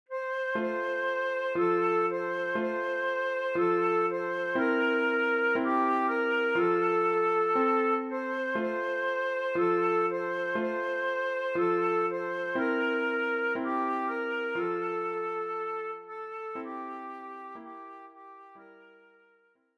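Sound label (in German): Ihr Kinderlein kommet Querflöte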